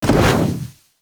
Wall Latch Start; Warframe; Characters; Tenno

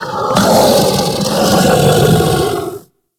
Kubrow Tame Sleep Idle Yawn G; Warframe

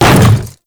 Hammer Boss Step Default, Footsteps

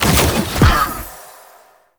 Reroot Return A; Warframe; Nokko

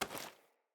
Generic Pickup

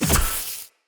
Soul Ability Removed

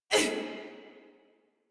Pain Female, Battle Barks; Warframe; Modular Corpus Female

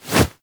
Arm Swing Foley Heavy; Warframe; Purgatory Warrior

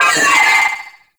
Vocal Pain; Warframe; Sentients; Sentient Trooper

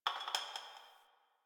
Brick Crumble; Warframe; Ambience; Nineteen Ninety Nine

